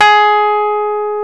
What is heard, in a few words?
PNO, Rhodes Mk.V; Keyboards of The 60's and 70's